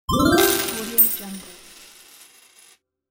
game coin

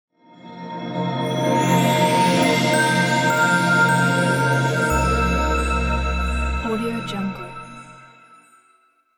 intro logo

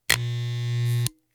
electric shaver short on off switch click

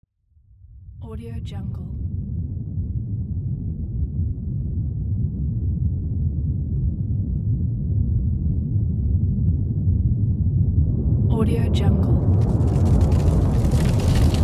earthquake

